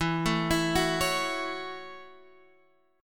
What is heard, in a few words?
F sharp Minor 7th